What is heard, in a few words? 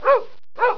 dog, animals